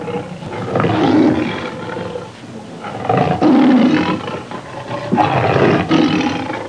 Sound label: Roaring lion